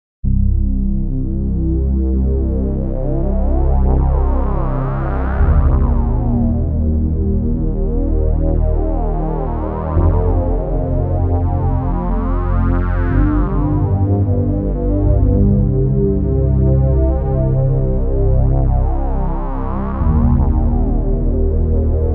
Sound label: sweep; opx jupiter